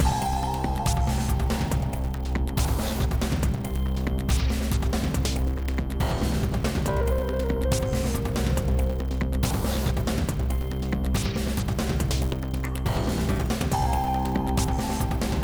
Combat 8CH 140BPM

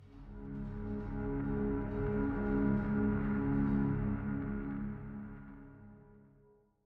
Suspense; Syria Castle

